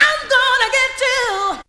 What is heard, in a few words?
VOC